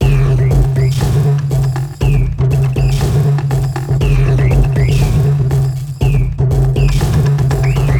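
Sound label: Loop, beats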